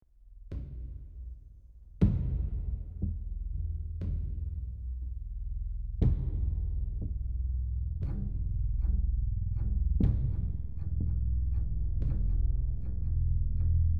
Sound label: Suspense Loop